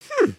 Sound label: curious, emotes